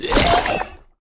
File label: vile spawner death; enemy